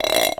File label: Bolt Glass Scrape, Medium Wine Glass